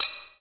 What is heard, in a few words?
BELL, JAPANESE